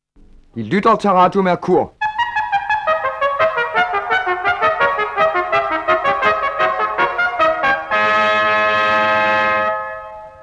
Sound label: jingle; Radio Mercur